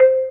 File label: winline, slot